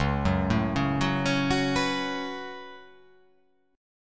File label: C sharp 7th Suspended 4th Sharp 5th